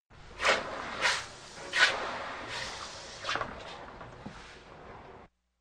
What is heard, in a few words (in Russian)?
podkova shipit